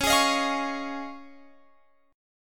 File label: Db Major Add 9th, Guitar Open F; strummed